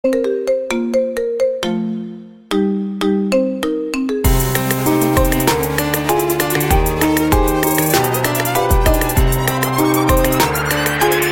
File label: iPhone Trap RmX; Evil Morty